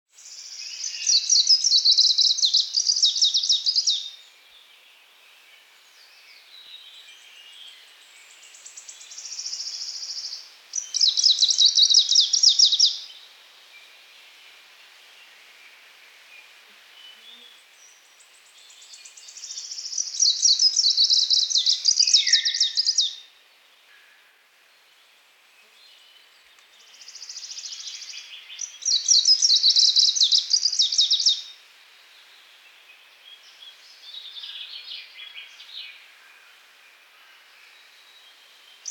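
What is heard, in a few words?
zelenaya penochka golos, greenish warbler voice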